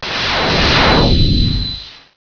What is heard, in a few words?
NEW LASER